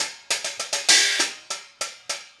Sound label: Hi Hat Pattern